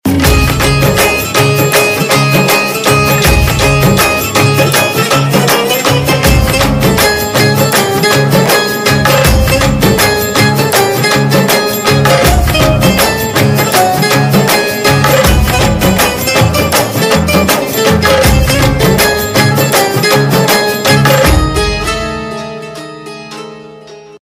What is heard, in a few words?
Drum Beats